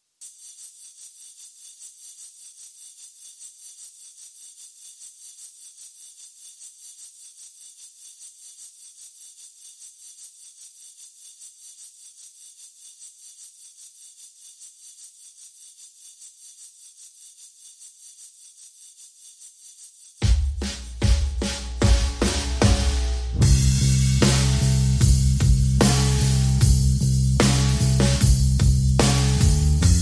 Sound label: Drum and Bass Mix